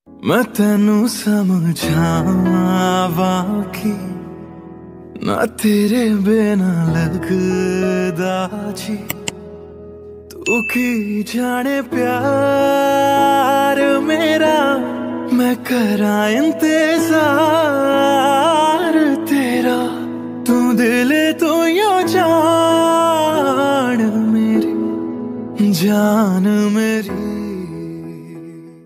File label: Sad Punjabi Song Ringtone